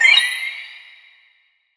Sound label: Battle Heal; SFXBattleHeal1